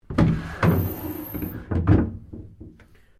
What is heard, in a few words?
DOOR WOOD CHART ROOM SLIDING CLOSE, Sounds onboard HMAS Castlemaine; Boats; Vehicles